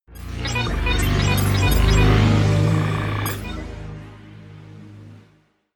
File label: sci-fi jingle neutral